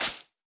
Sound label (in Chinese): 湿海绵 踩踏声